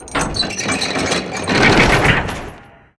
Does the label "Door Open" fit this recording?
Yes